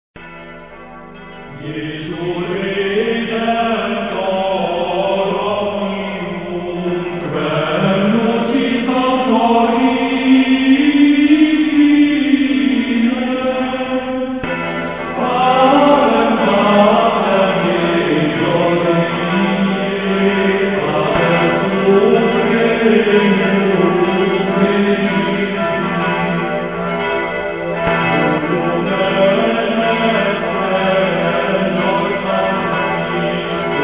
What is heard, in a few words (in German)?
Choral mit Glocken; FAUST Soundtrack